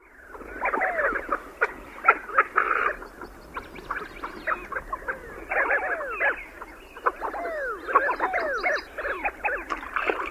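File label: courting group, bird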